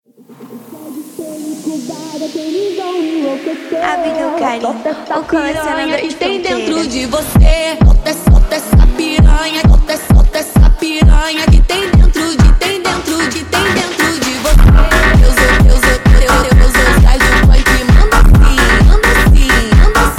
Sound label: Phonk